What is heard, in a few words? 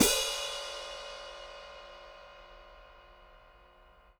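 open hi-hat Acoustic Step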